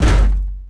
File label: metal crunch med; objects; Star Wars Obi-Wan; XBOX